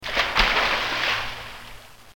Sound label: Splash